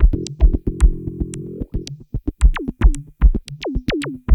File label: ELECTRO 112, loop, BPM; 100-114 BPM